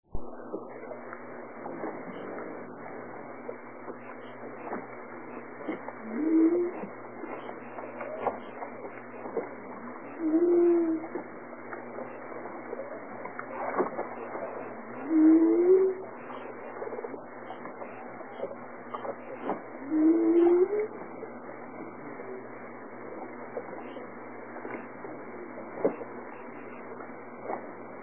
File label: whale talkers